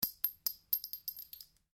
Cartridge 22 caliber short impact ceramic tiles, shell casings; shell casings of different calibers impacting on different surfaces